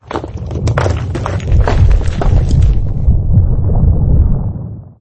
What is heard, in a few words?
golem